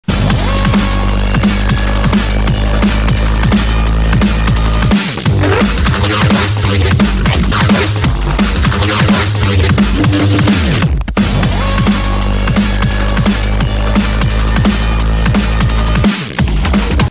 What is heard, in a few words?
zm dnb